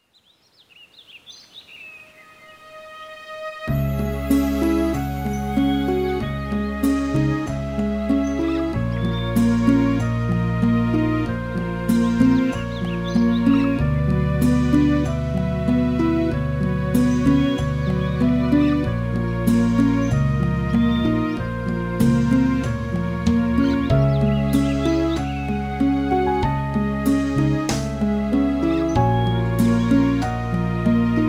Mystical Forest; dark water slime invader; switch windows

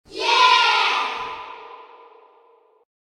Yeah Children Full Echo; Kyurinaga's Revenge

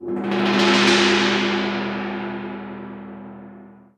BGM 3 Stars China; Shadow Bug

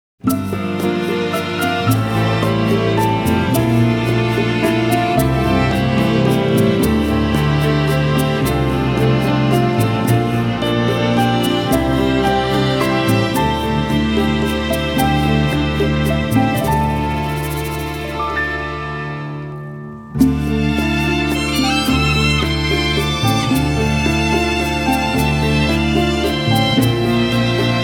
Azure Rainforest; Etrian Mystery Dungeon; Sekaiju to Fushigi no Dungeon 2

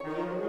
Music Dialogue Neutral Start 60bpm; Asterix Obelix Slap Them All 2

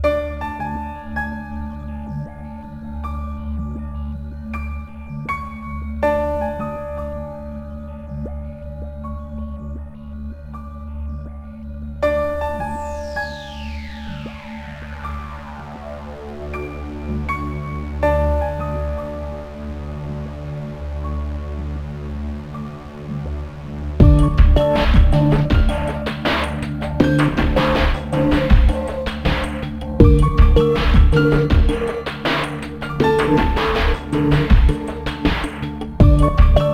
Sonnalin Mountains (Ambient); Eternal Legacy; Android

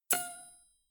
Ninja Collect; Doodle Jump; 3DS, Android, Arcade, DS, iOS, Mobile, Xbox 360

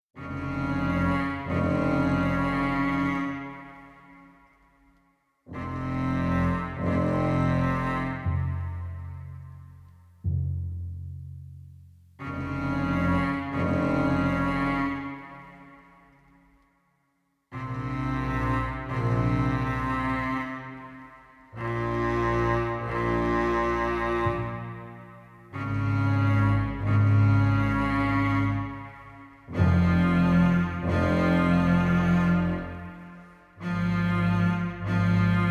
Storm; Inmost

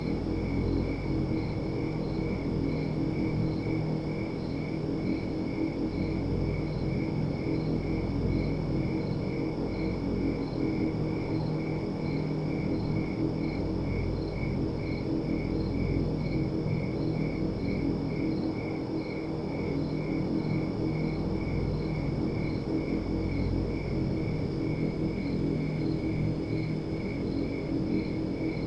Ambience; Evil Dead: Regeneration

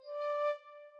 Flute

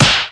Boxing; Conquer Online 2.0

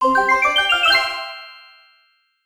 Jingle Stamp; wii fit u

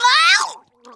Face Bail Female

